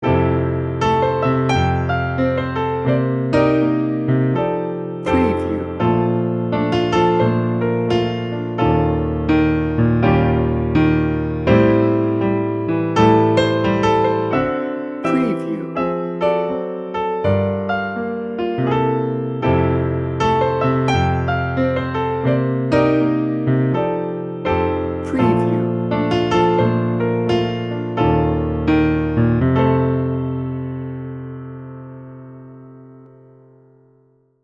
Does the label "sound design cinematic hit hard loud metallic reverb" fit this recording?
No